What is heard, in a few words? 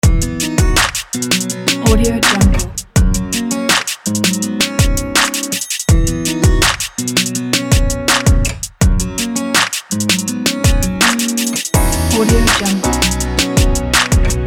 new school rb